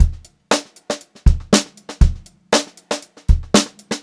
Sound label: FUNKY, Funk Groove Drumloops; USB Soundscan vol.38; AKAI; 90 s Sample CDs